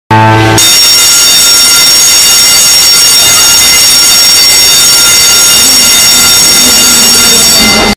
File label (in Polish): dzwonek szkolny